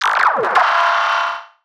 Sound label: Short FX